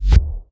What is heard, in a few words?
impacts; World of Tanks